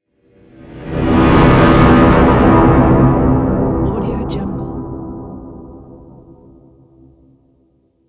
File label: braams action